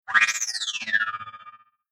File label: SciFi Robot Chatter Alien Transmission Shimmer Computer Button Digital Space; Sound Design; Science Fiction Sound Effects